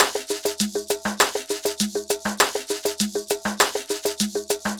Ensemble, Latin Percussion

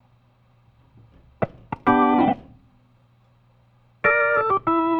Organ, 70s Analog Funk

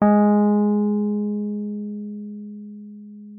WUF G#3, WURLITZER; Xsample VOL-1

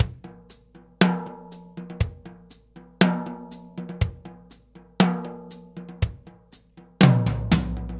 Laid Back New York Loop, Drum; Sonic Foundry (Sony Creative Software); Loops & Samples Drum Tools

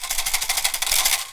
PU IL, HAWAII PERC; AKAI S6000 CD-ROM; WorldSounds

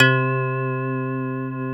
key, 135 BPM; Ueberschall; Drum & Bass Resonance

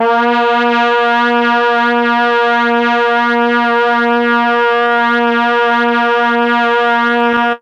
MEL BRASS; ILIO; Propeller Island; Legendary M400

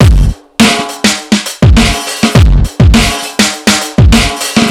Distorted Loop, Drums & Percussion, Electronic; Sonic Foundry (Sony Creative Software) - Electronic Point Blank

